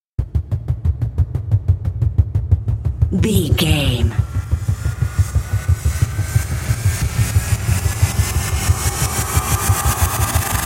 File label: dangerous developments drum bass